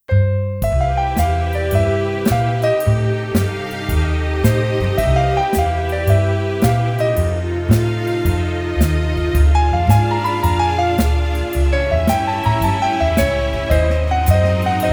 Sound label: Sunny Sunday Jazz